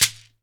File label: SHEKERE, Synclavier Percussion, World & Orchestral; ILIO